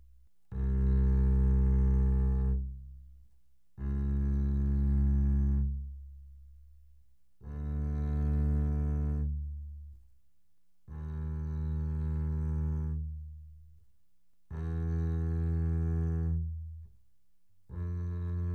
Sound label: Bass arco mf sul E C2 B2; spiffyscore